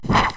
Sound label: swish